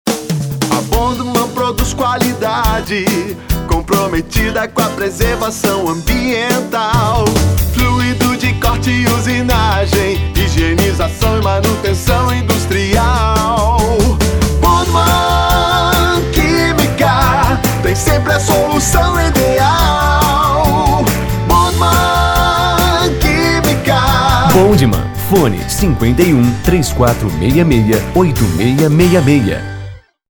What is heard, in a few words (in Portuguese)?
Jingle; Bondmann